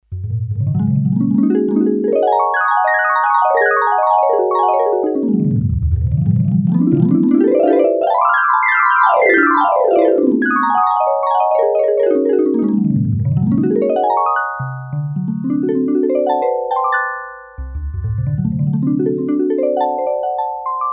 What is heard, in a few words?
Harp, Korg DS-8; Factory Patches